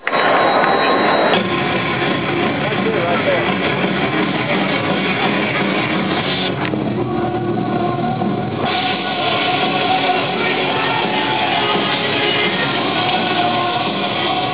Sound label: nascar sounds